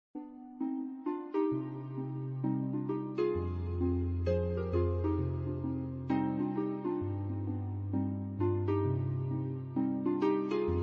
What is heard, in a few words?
instrumental